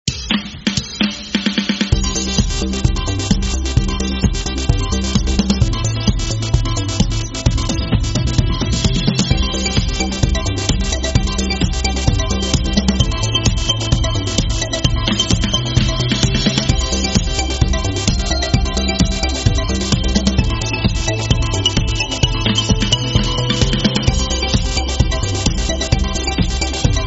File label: Pop